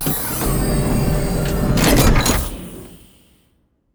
door opening; ancient relics

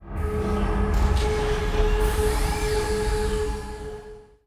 event mega structure other empire build start; Stellaris; utopia

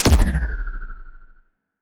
healing projectile, weapons; Stellaris; biogenesis

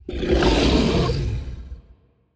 kaiju grow up; biogenesis

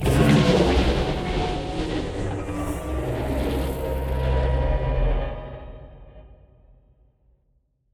void worms troika death; Stellaris; grand archive; ships

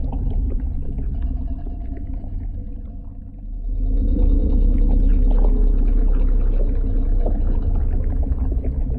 void worms nymph idle; Stellaris; grand archive; ships

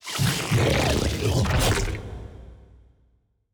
engulf; weapons; cutholoids; Stellaris; grand archive

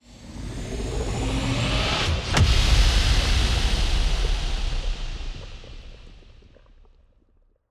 toxic planet hit; weapons; Stellaris; toxoids

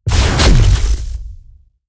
dragon wing attack; Stellaris; guardians

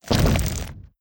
swarm station explosion small; weapons; Stellaris

